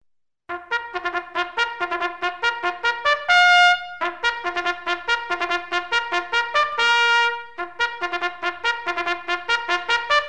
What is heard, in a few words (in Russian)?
voennij gorn signal ataka